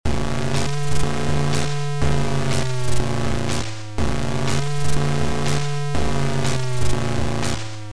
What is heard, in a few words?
Warning; Graster; Supaplex